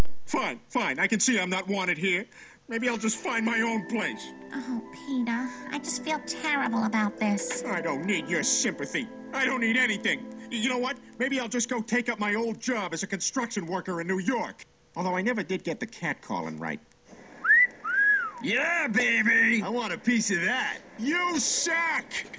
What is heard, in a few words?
Is Calling Rock